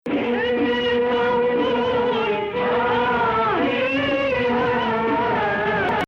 Rast Sihtu 2nd Rast

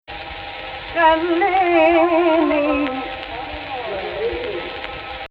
AHH, Egypt Rast

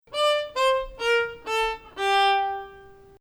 Bayati Nahawand, Maqam Bayati; Nahawand 4

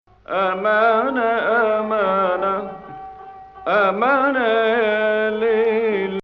Hijaz Octave

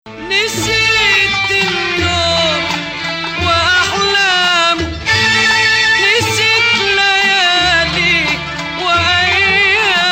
Nahawand

